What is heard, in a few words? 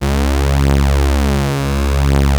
OB C#3, Rare Synthesizer; Hollywood Edge - Giorgio Moroder Rare Synthesizer Collection; OB 4 VOX.